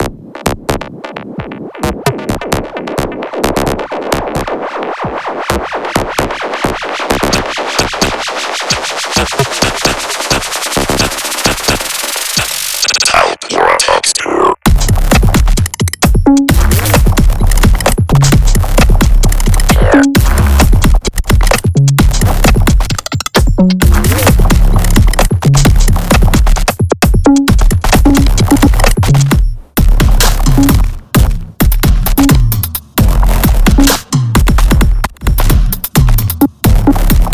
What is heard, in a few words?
Boop boop boop, Adorable chaotic funny robots